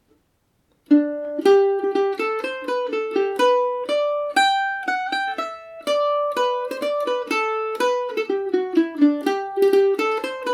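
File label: G major, mandolin; minstrel boy; Mandolin Cafe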